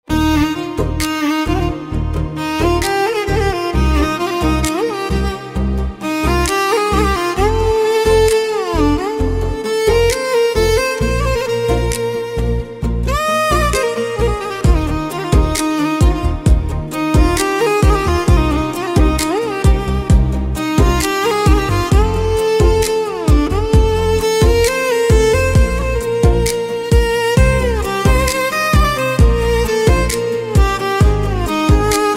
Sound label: Violin